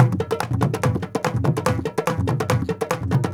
INDIA, IND ENS; Spectrasonics; Heart of Asia

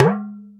Talking Drum Up; Multisamples; Digital Sound Factory Planet Earth

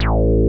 SY BASS, ANALOG BASS; Music Store - Claudius Diemeter Schwerlos

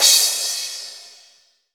CRASH, Crash Cymbal; MONO; AKAI S6000 CD-ROM - Volume 3; AMBCRASH

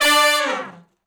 Med Length Falloff (D) unison, Horncraft for R&B; Sections; Crimson Blue and Fabulous Horncraft 4 RnB

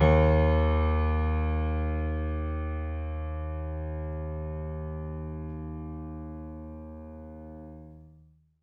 MIX L, Acoustic Piano, PIANO STEREO; InVision Interactive; Miroslav Vitous

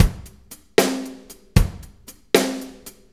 6-8 Loop, Full Kit Loops; Sonic Foundry (Sony Creative Software); Loops & Samples Drum Tools

